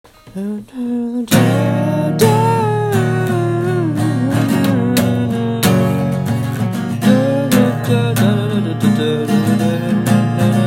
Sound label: post chorus